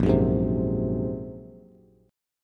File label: Bb 7th Suspended 2nd Sharp 5th Bb, Bass Standard; Bb7sus2#5; strummed; JGuitar